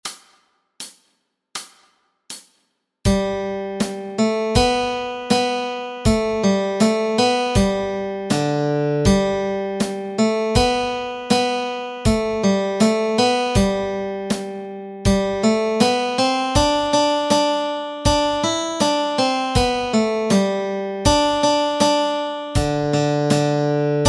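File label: Melody Track, Easy Guitar and Ukulele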